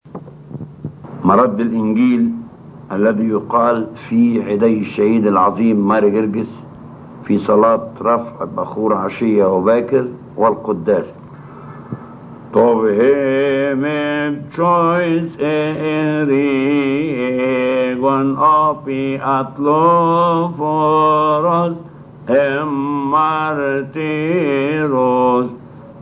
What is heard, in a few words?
Gospel Response; Alhan Shohada; Martyr Guirguis